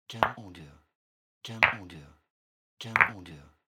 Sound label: Stone Knock